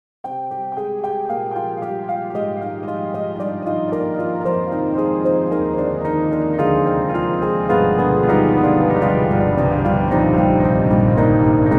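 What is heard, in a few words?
Warm-Natured, piano; Steinberg HALion 7; Verve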